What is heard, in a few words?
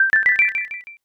score up